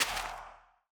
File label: break, soulsand; The Monster Plane